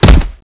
claw strike; oblivion darkangels

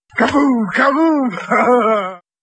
kaboom

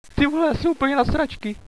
cough, hostage; cstrike

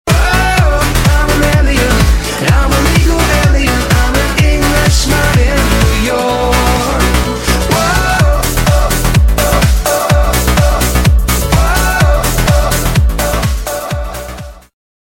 surf

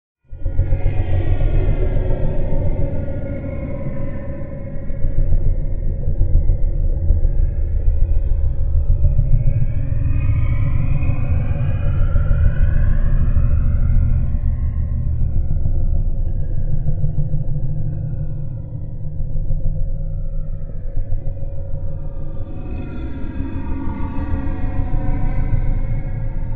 zm swarm; csproject

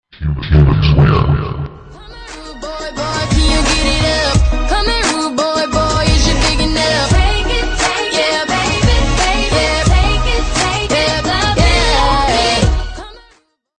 humans win

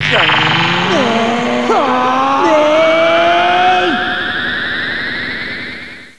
powerup; savior

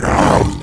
nemesis pain

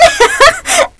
cheer; zmParadise

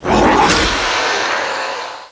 monsters pain; oblivion darkangels

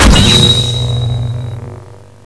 flare on

